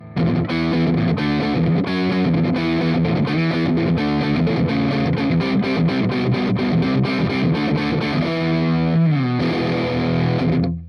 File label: Neck HB Gain